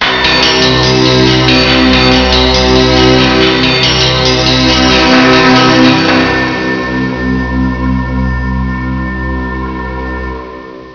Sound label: SYN RIFF